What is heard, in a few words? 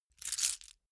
key ring large place